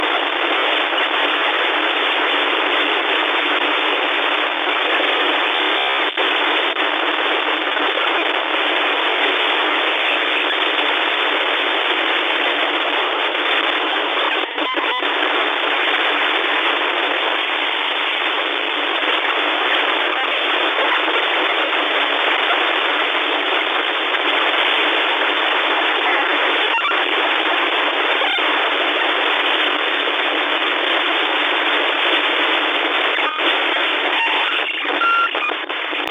Long Glitch

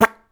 Quack Fart Noise

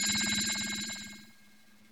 Anime Gets Scared

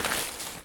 Gravel Sweep

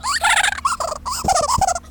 laugh twice speed

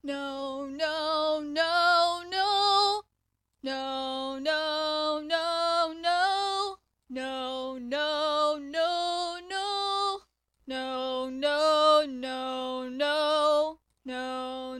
No No Sung Sample

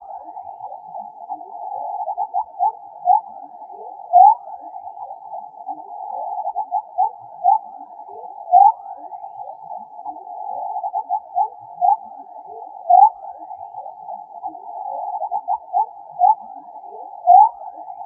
Animal synth

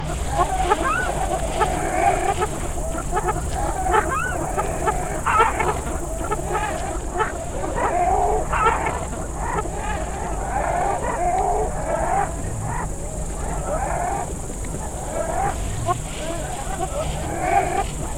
Jungle

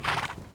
Horse Snort

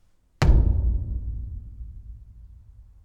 Bump Against Metal Plate

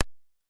Gun Shot In Anechoic Chamber

